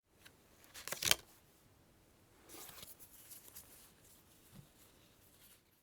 card game

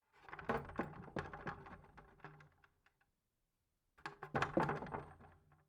hit and rattle

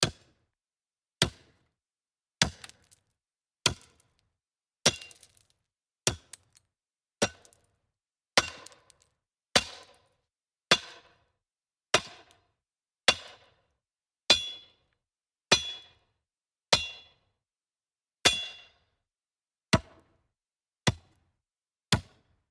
chop

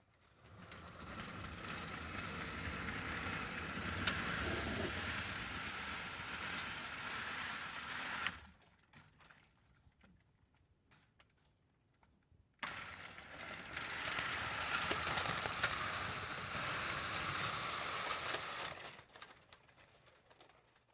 slotted blinds open and close

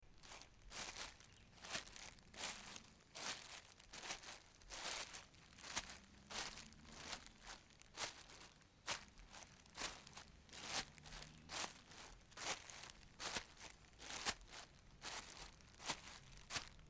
sneakers on plastic sheeting

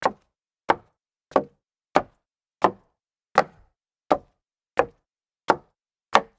walk up solid wood stairs, foley

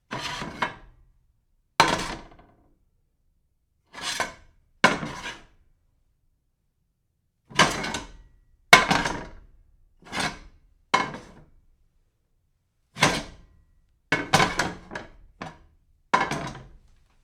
metal gear shifting

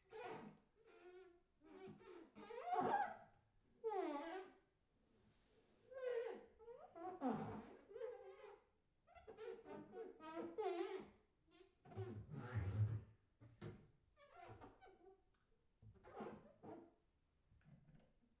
wet squeak rub on bathtub

